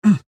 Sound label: Hurt